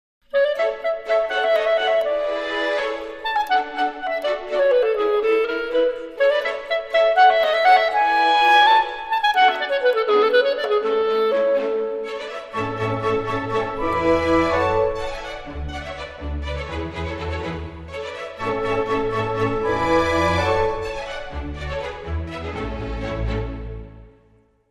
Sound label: Clarinet Short